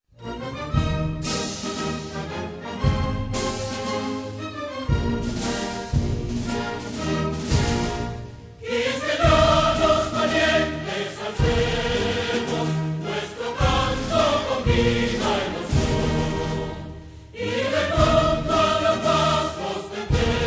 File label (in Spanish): Himno Nacional de la República Dominicana Coro